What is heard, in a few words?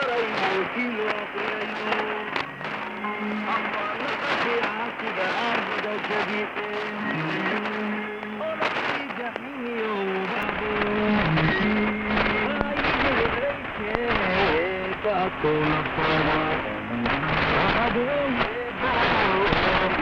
Radio Djibuti 4780khz AM